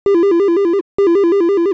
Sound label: ring